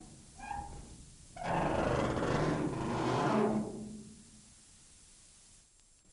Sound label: Axe Out Hood Metal Sc